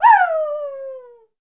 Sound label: Dog; blue archive